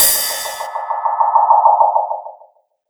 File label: CYMBALS, Disco House; USB Soundscan vol.20; Fresh Disco House I; AKAI